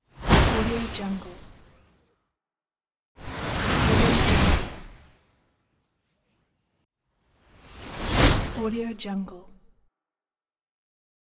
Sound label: whoosh; kaelv